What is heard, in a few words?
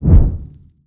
burer tele throw, monsters; Soundscape Overhaul